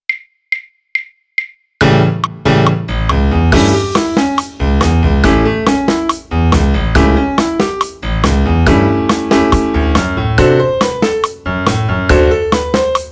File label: rock n roll piano drum kit click